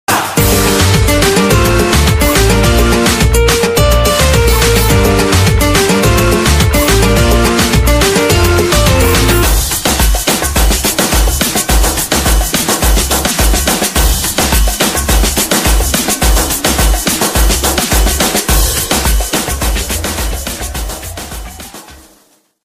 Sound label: Mexican ringtone